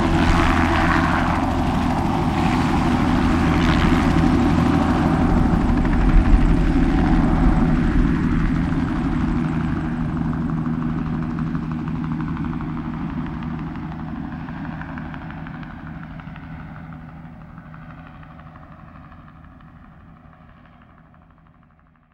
COPTER TAK, Helicopter; SFX

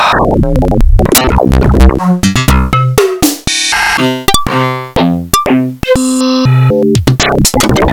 crosswave, wavetables

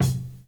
KIT, Dance Mega Drums, HIP; Best Service

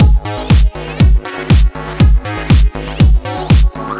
120 Prog Chopz Full; Zero-G; Soundclash Nu Dance Electro; Combi Loops; 120bpm

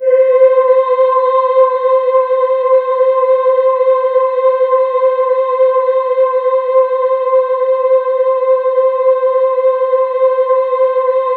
C5, Synth Leads

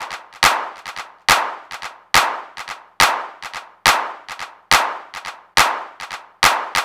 NRG Percussion, Trance

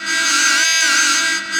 AIR RAID, INTRUDERS; Spectrasonic Distorted Reality 1

